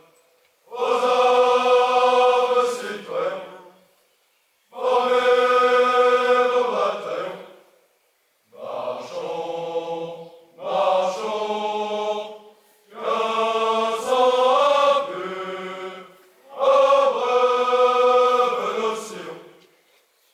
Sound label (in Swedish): Nat Sang; omskakande vandring pa omaha beach